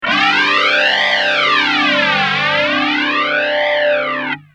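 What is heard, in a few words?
guitar, ADA flanger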